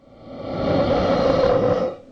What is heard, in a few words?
breath last; Apotheorasis